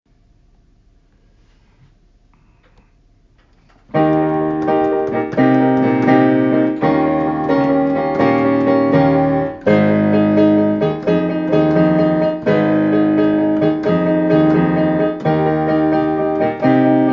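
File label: Accompaniment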